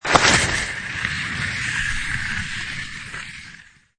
Skiing Snowboarding Ski On Snow Close Then Further Away; Lynne Music